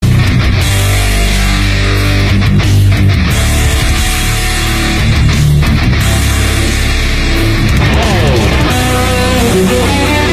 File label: Metal